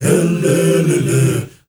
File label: MALE HUM; Spectrasonics; Heart of Africa